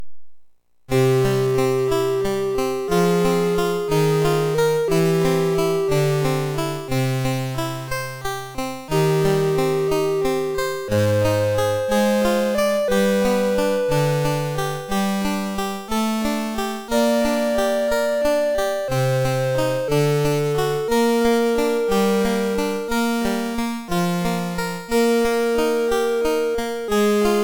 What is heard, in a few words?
Synth Sample, sid MOS6581R3